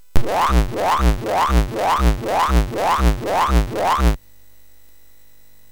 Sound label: Final Synth Sample, MOS6581R3; hvsc